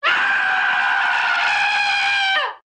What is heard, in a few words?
scream; Barotraumatic